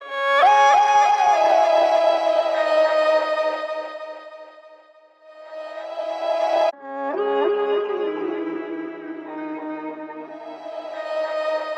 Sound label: 143 BPM C# Min Lead, Drill; Cymatics; Nothing Left